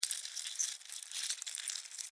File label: Insect Move Bug, Insect, Movement, Bug; Sound Effects:Animals:Insects; Blastwave